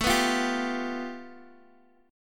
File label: A 7th Suspended 2nd Sharp 5th, Guitar, Open G